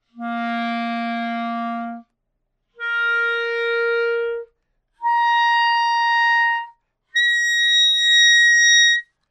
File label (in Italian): CL Ottava